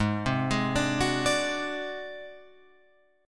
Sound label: A flat 7th Flat 5th A flat Guitar Open F, chord; Ab7b5; 8,7,8,9,9,9; arpeggiated; chordshape; JGuitar